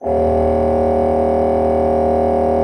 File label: D1, Cathedral Organ; Propeller Island